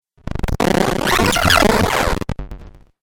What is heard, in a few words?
Crazy Glitch 8Bit Style; Video Game; Video Game Sound Effects